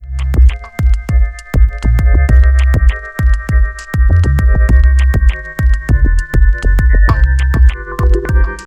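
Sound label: Ambient Stream; Fashion Studio Paris Collection; nds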